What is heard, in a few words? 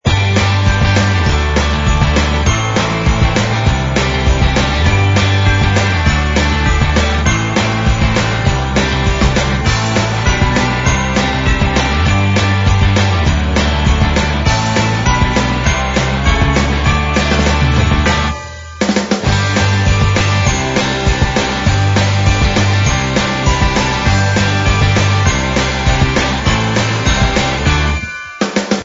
music; coal elf challenge